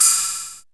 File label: Perc FX, Percussion; Best Service ProSamples vol.37 - Dance Synths